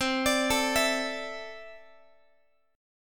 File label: C 6th Add 9th C down Guitar; C6add9 arpeggiated